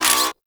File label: Kinetic Plating Cast; Warframe; Runner